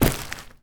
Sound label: Forest w Twigs